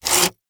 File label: Foley Plastic Scrape On Concrete Fast